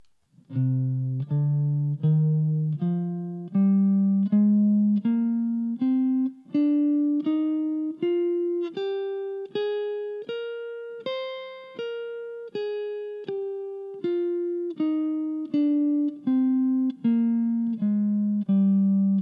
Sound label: C Aeolian, guitar